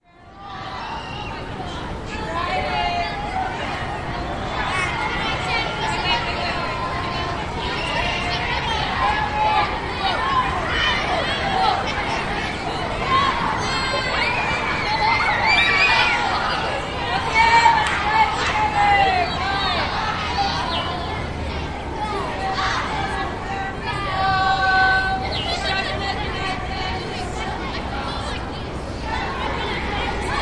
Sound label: children group Play, Yosemite; Children at play; Spring trip to Yosemite Valley